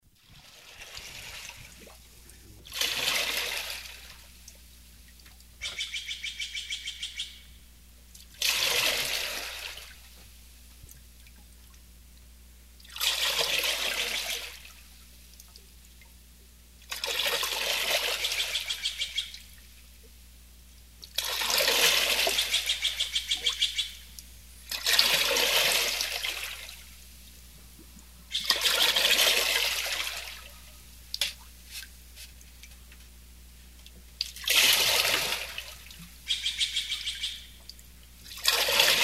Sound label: rowing a boat on calm lake